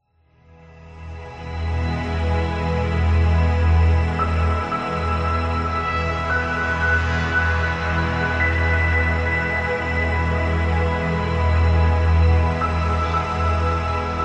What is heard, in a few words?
Atmospheric Documentary